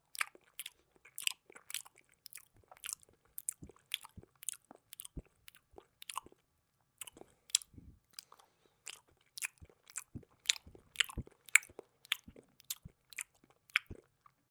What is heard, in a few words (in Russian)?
zvuk chavkanja